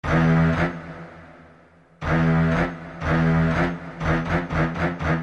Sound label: notification; jaws